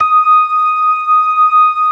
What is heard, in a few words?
ORGAN LOWER MANUAL